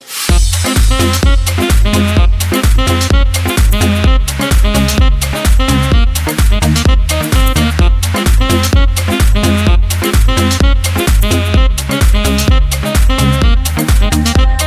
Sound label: saxofone, blues